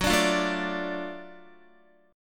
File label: Gb Minor Major 7th Sharp 5th Gb down Guitar; strummed